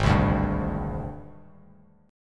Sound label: G sharp Major 7th Flat 5th Bass Standard; 4,3,0,0; JGuitar